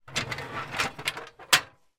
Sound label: industrial metal step ladder close fold up